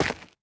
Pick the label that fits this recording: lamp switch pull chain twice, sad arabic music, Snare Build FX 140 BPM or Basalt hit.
Basalt hit